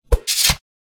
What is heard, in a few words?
sword, weapon attacks; psfx